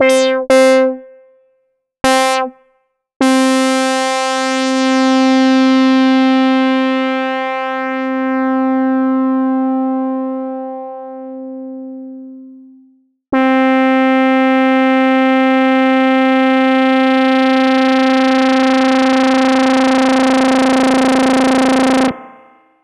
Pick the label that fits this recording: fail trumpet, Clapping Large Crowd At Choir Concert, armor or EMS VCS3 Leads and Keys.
EMS VCS3 Leads and Keys